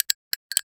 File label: ultra realistic close up, toy